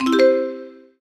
checkpoint get; Golden Crayon Sounds

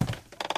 bridge step; Golden Crayon Sounds

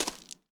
gravel step; Golden Crayon Sounds

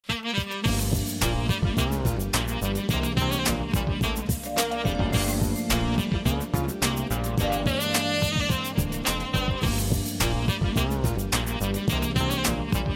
background; RS Games Sounds; Uno

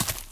rocks step; Golden Crayon Sounds

